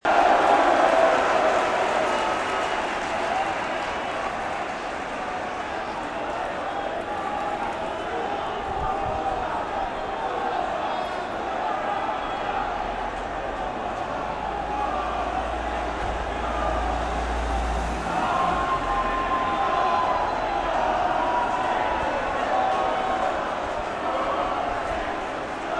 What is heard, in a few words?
Indian fans